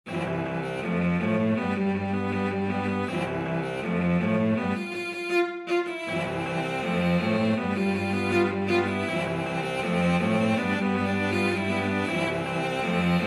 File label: cello quartet